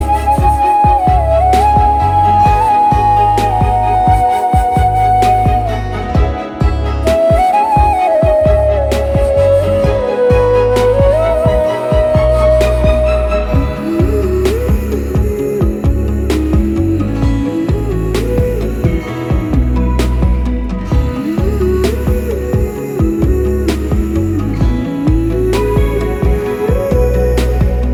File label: Instrumental BGM